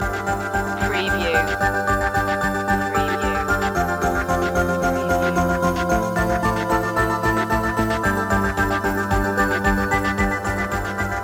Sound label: pulsating lights loop